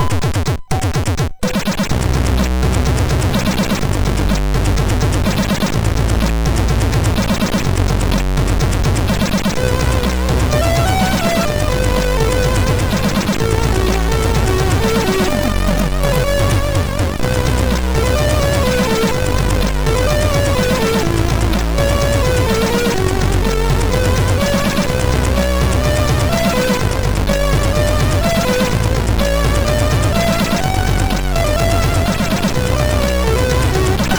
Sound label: Acid House, MOS 6581R2; hvsc